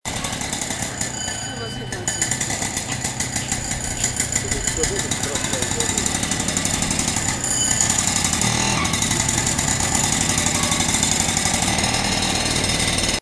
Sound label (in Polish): pierdziawka; Chiny